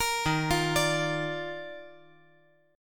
D sharp Major 7th Suspended 2nd; D#M7sus2 arpeggiated

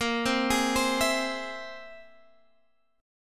C Augmented 7th A sharp

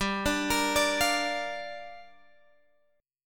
G Minor 7th, Guitar, Open G

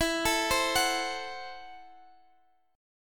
F sharp Minor 7th Flat 5th E

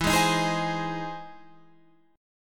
E Augmented Major 7th E down Guitar Open F; strummed; E+M7; JGuitar

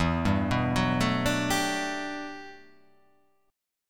E-Minor 11th, Em11 chord; arpeggiated; JGuitar

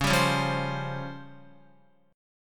Db Major 7th Flat 5th, Guitar; x,4,5,6,5,7; DbM7b5 strummed; JGuitar

